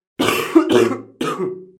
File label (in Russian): zvuk kashlja